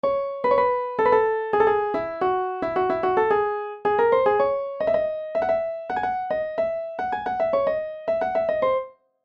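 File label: C sharp minor 70 bpm, Classical MIDI Melody; Master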